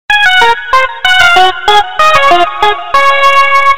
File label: nokia duan xin